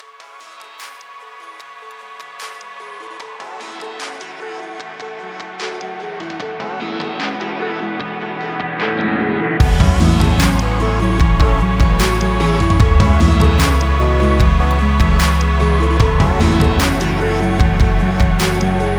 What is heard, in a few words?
outro capped